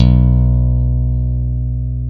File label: BS CHAPST, ChapmanStick; Roland LCDP02 Guitar and Bass